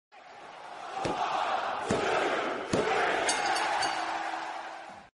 wwe pinfall bell sound effect

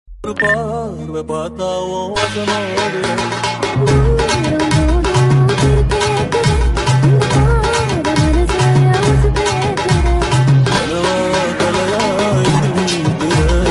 song remix

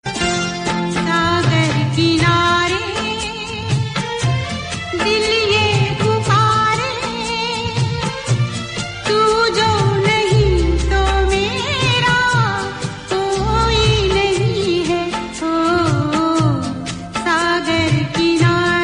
instrumental bgm

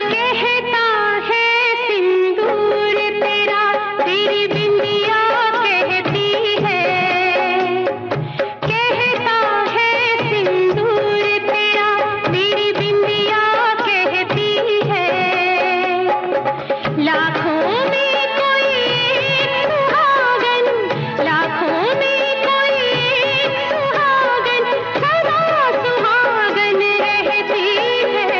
hindi old song

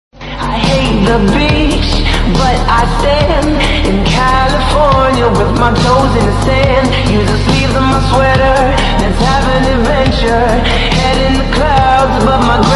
slowed reverb

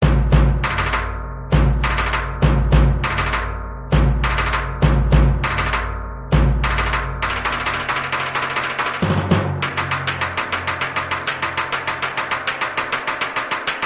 sangu sound bgm; lord shiva

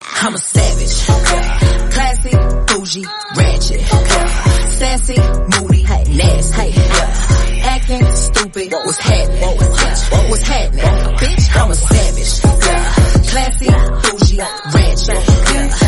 remix, english song

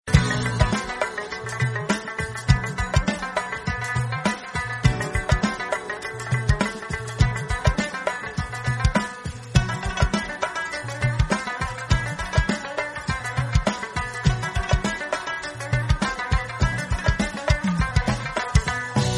soft pakistani ring

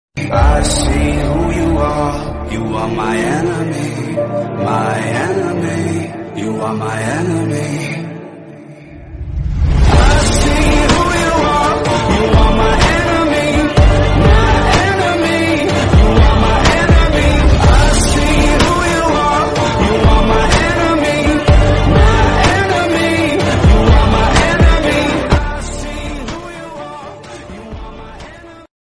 english song

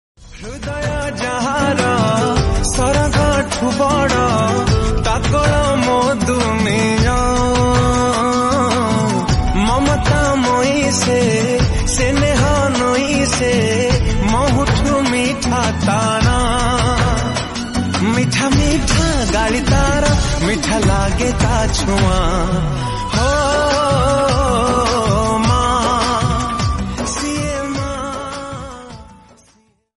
emotional odia

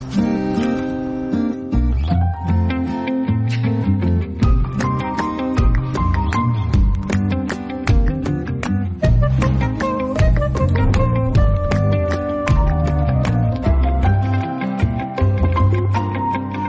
background music; axis bank

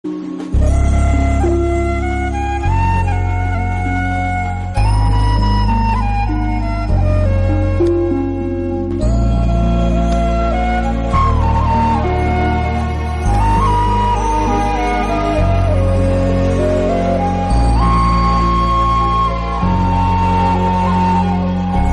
flute bgm

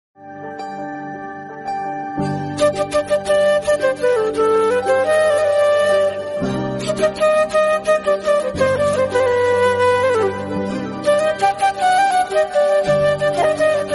flute instrumental

